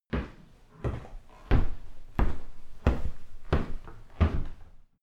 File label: step shoe on wood